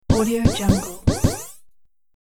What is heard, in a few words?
multi jump game player character action